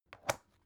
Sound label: folder open light